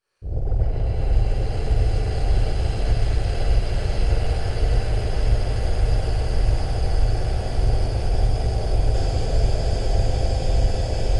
drone dark long bubble; sonniss gdc

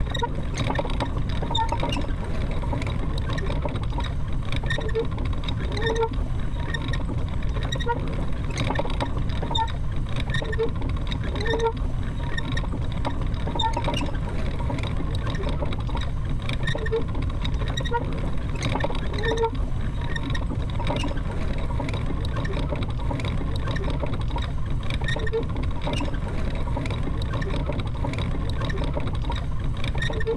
Old Wheel Turning; airtemple

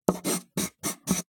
color pencil; sonniss gdc

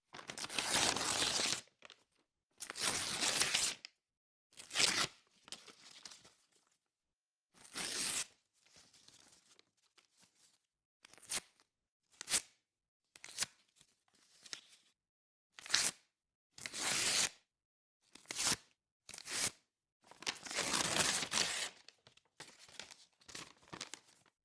paper tearing; Sonniss GDC

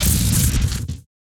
transition elastic; sonniss gdc

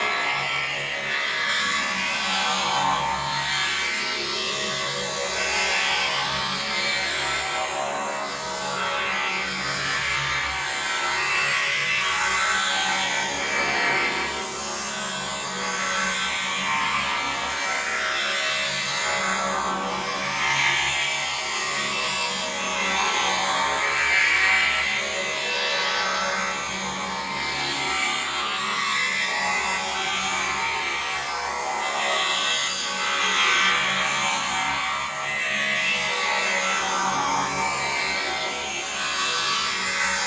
alien spacecraft electrical drone; sonniss gdc

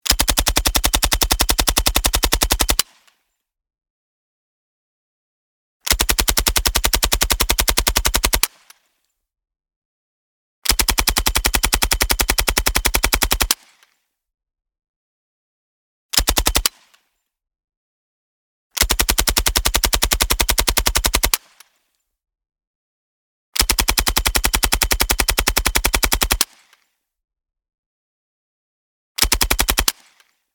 smg m3 greasegun submachine gun auto shot x7

